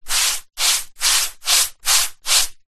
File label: industrial paint brush strokes on thin wall multiple; zapsplat